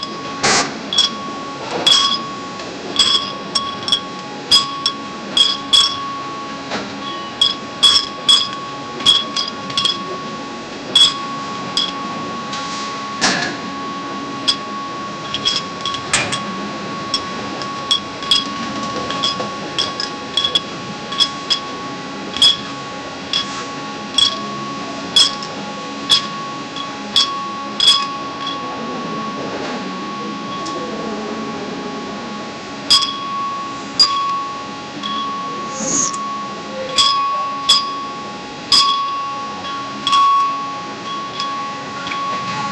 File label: the sound of a bottle, factory